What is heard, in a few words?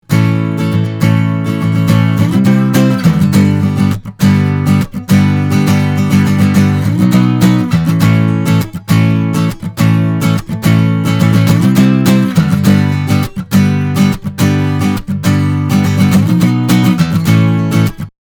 Acoustic Git RND RMP D8 Distortion Oktava102